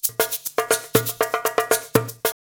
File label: SHAKER, Modern Percussion Loops